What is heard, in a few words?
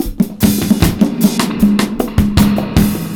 ONE DROP, 76 bpm, Breaks; POTBreaks